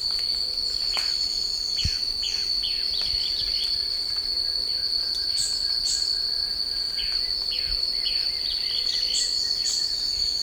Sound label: Conopias trivirgata variation